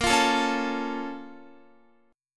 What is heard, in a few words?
A sharp Minor Major 7th, down, Guitar; A#mM7 strummed